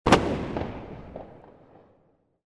explosion

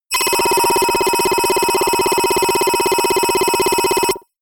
notification data transfer aggressive

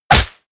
stylized fist punch

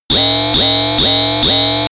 danger alarm

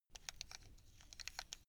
buckle rattle short slow

